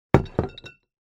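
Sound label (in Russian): ставят на стол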